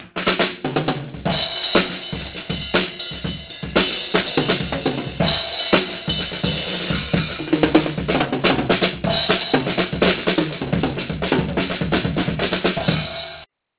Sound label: funky